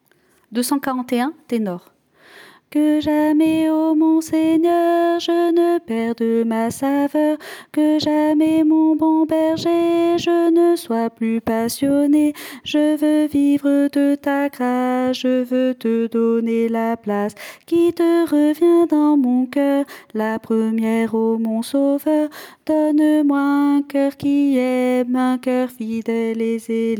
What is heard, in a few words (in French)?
Tenor